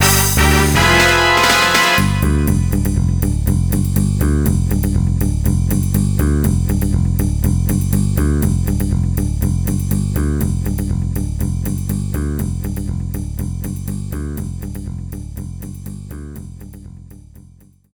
Final Fail; Confidential Mission; Dreamcast

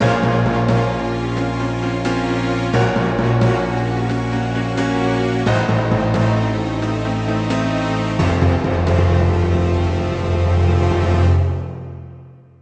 Game Over; puyo puyo sun 1997 saturn gamerip

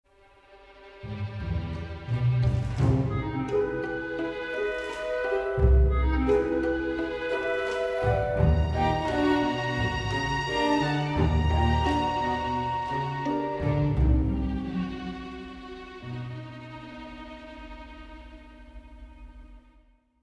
Hatchery Music

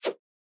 Dash; Boomerang Fu

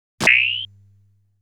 Boss Fires a Bullet

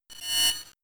Screech

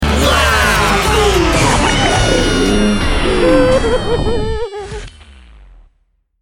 Battle Failed; Adventure Xpress

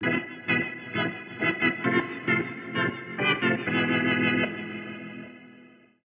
organ

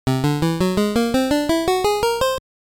Unused Jingle

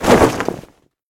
Clear Canvas; bad piggies; android; mobile; windows

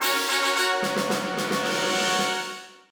Baseball

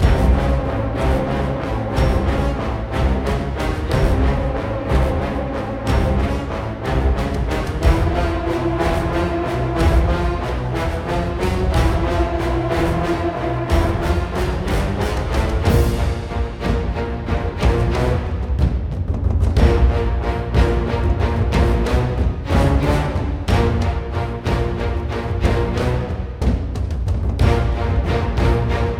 Bossfight Stage Frantic; ryse son of rome 2014

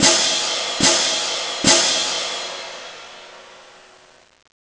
Cymbals; kentei kyoukai imasara hito ni wa kikenai otona no joushiki ryoku training ds

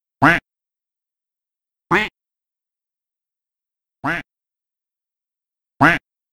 Duck Sounds

